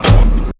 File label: launcher reload; weps; svencoop